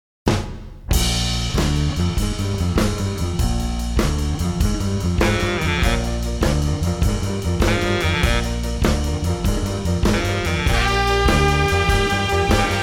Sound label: Sliding Horns